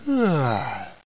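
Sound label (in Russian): зевание мужское нежное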